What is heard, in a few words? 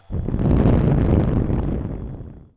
debris; kdesavers